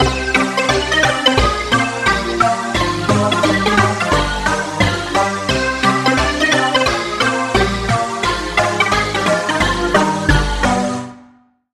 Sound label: Key; Digit Choir; Routed Sound; Spire Expansion - Hyper Gamer Vol.1